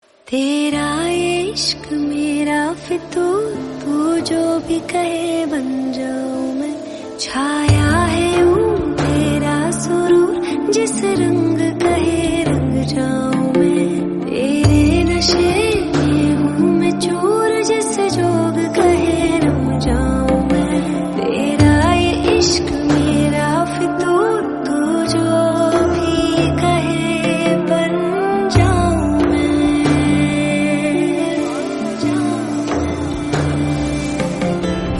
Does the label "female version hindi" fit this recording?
Yes